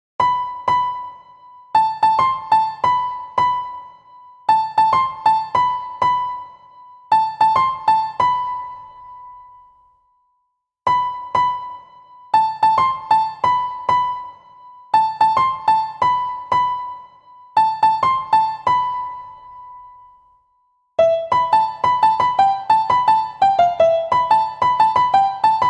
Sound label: theme bgm easy piano; Hrithik Roshan; perfect piano